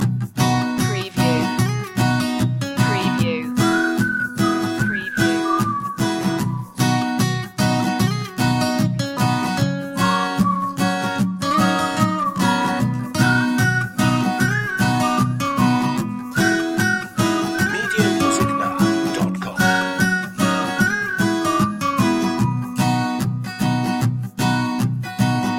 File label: gone fishing loop; country life